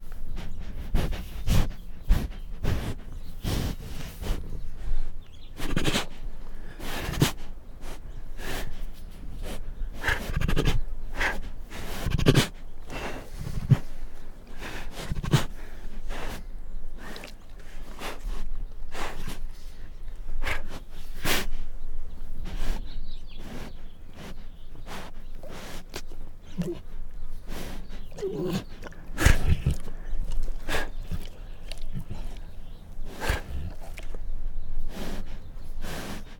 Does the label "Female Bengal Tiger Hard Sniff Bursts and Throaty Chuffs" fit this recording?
Yes